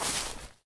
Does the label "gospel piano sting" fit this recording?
No